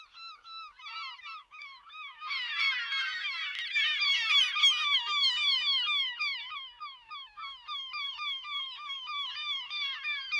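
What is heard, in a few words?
pure seagull calls realistic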